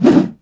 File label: wolf swing; wolfteam